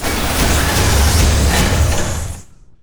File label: turret reload; weapons; Quake 4 Alpha; id software